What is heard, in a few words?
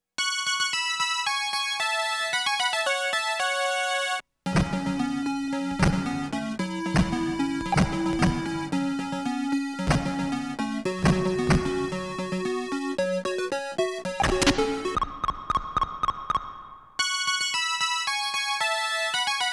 Opening - Main BGM - Death - Stage Clear - Last Stage Clear - Game Over; konami game music volume 2